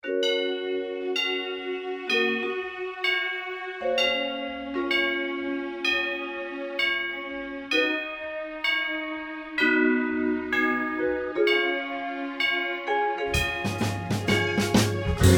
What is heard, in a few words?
Instrumental with Harmony